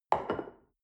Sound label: shell upside down place on table soft; berufe; gastronomie; soundpool